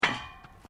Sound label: Footsteps Boots Grill Metal Step; Footstep Foley; Footsteps (Sound Effects:Human)